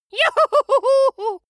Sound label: dwarf laugh